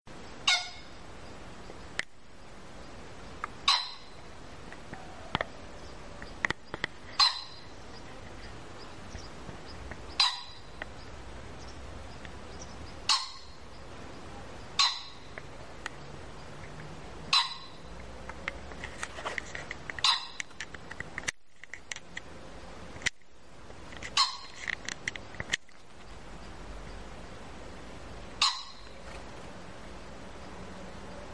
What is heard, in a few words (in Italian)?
fagiano